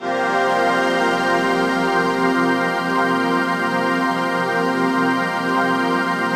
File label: CHRD PAD; Best Service; XX Large Pads; Sample CDs